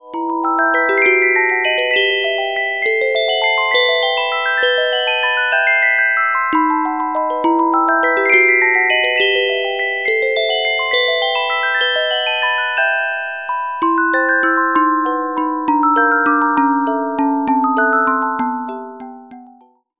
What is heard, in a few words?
Carillon Music Box Melodies